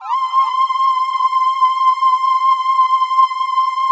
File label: PAD; Best Service; XX Large; Pads